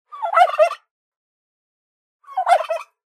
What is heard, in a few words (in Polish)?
indyk